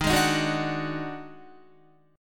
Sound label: Eb Minor Major 7th Double Flat 5th Eb down Guitar; EbmM7bb5 chord; JGuitar